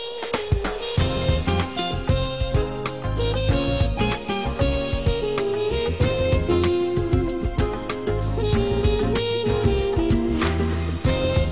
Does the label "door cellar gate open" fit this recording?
No